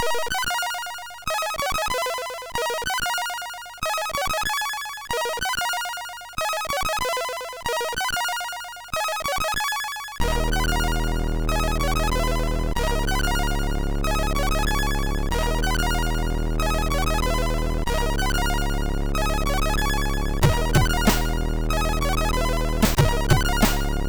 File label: Punk, MOS6581R3 SID; Creo